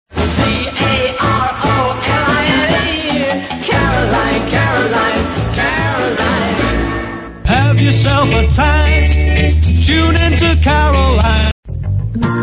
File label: jingles